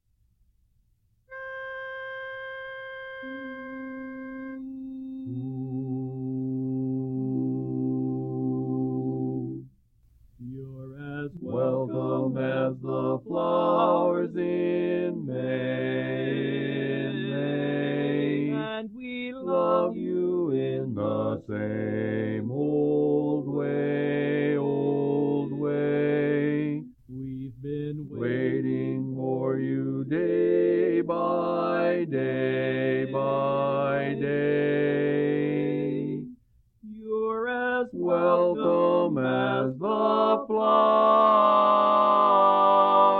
Bass

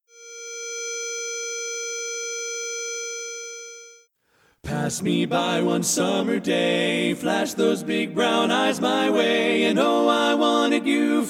Bass missing; Polecat II

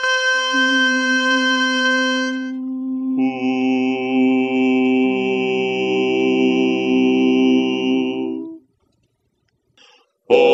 4 part; Christmas

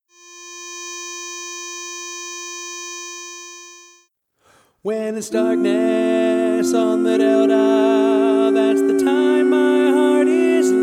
Bass missing; Polecat II

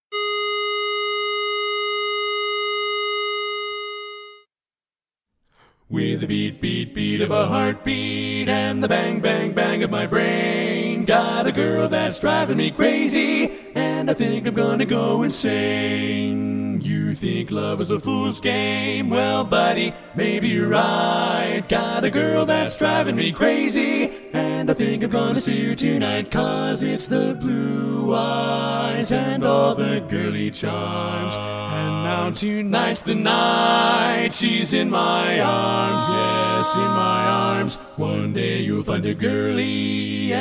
Tenor missing; Polecat II

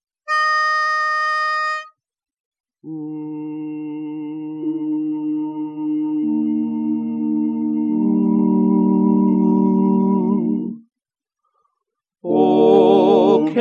lead, O Canada; Barberpole Cat I Learning Tracks

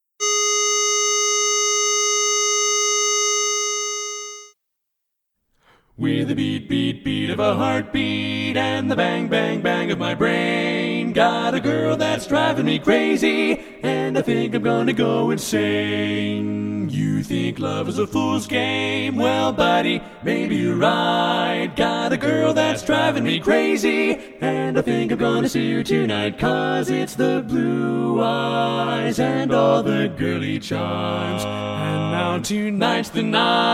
Baritone missing; Polecat II

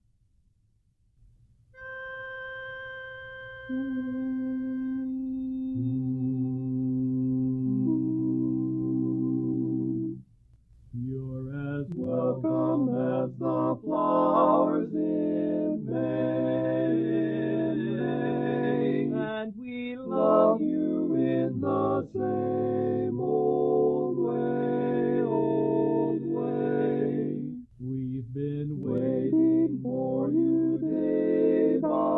Tenor

